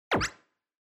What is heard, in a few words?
Short Accent Dry